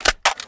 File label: vintorez clip in